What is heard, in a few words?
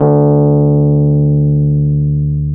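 Fender key bass D2; Sonic Reality; Vintage Timetraveler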